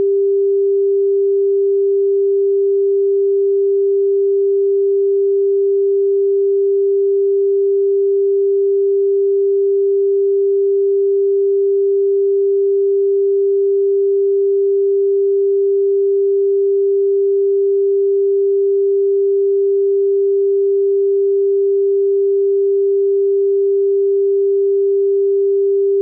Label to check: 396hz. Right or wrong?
Right